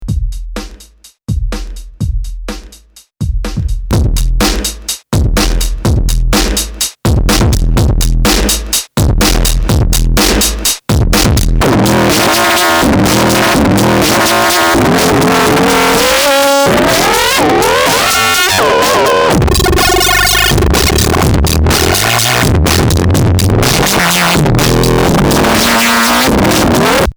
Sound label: buffered death loop drums